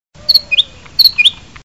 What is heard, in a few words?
Sweet Bird; meloboom